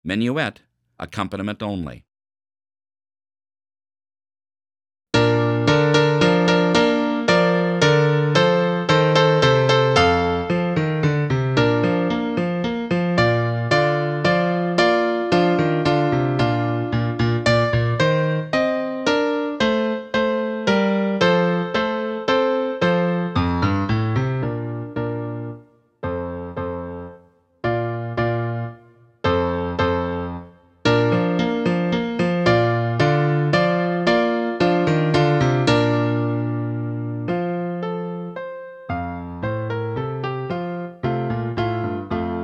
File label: menuett accompaniment only